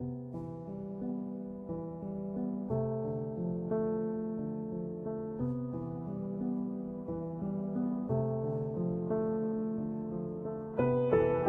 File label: music artist garret